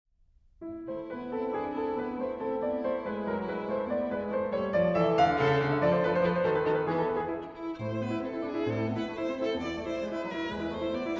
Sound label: Sonata in A minor, Violin Sonatas; Norbert Brainin; The Complete Violin Sonatas Vol. 1